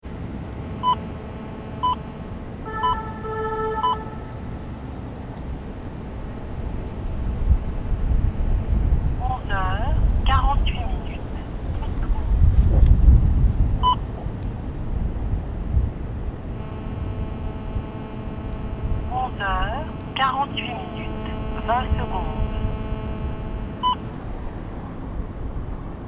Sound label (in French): sirenes; invalides